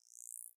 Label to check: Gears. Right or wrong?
Wrong